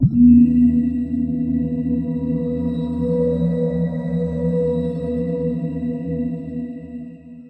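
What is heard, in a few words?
choose target for damage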